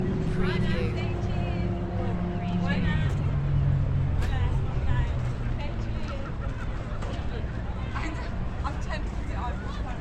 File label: Brighton promenade ambience people taking photos; beanstalk audio